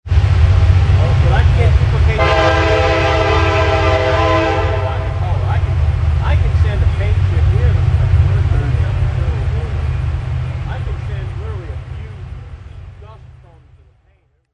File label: Train; Gaithersburg 2005